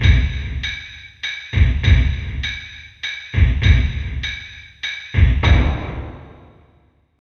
50 LARGE END 2, Industrial Loops

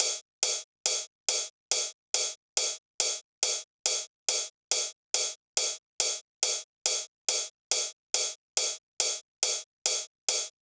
drum Loop 140BPM Ride; Vengeance - Essential Clubsounds Vol.5; VEC5 Drumloops; VEC5 Multi Loops 140BPM

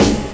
Snare, Percussion Hits, Snares

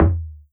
Toms, Elektron MachineDrum; SPS1 MKII

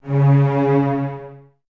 Cellos C#3 Detache; Miroslav Vitous; String Ensembles

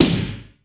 SNR; Crypton - DrumBase5000; GATE KITS

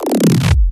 Scratching FX

